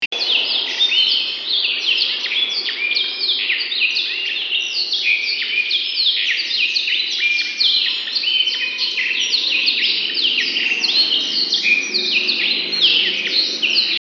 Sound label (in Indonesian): kenari lokal isian; obelix jr; ys